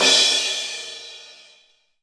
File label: Crash Cymbal